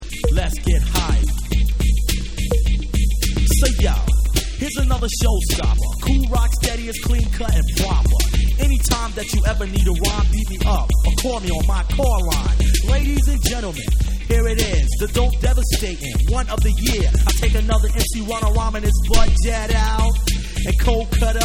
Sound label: Hip Hop Mix